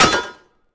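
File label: impact sword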